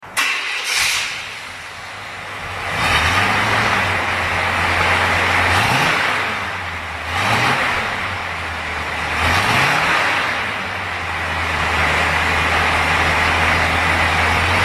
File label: BelAir 350 Duals stock mufflers